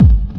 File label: hardbassdrumz, breakcore; Bazzdrumz